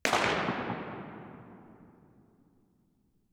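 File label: Church Center; Reverb; 30ft; 300 Seat; FL Studio 9; Fruity; Edison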